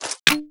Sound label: pipe reload, pipelauncher; weapons